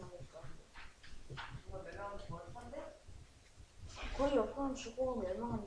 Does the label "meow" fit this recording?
Yes